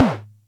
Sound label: Music Percussion Computer Tom, Drum Machines